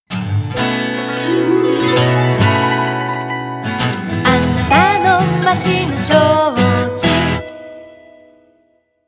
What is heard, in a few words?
jingle